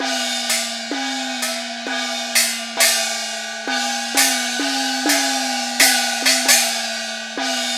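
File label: percussion 130 BPM, right; INIS - Opium; PERCS 130BPM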